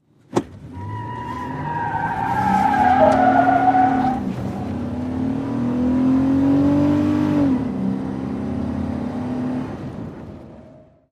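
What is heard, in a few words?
SUV Truck Accel Peel Interior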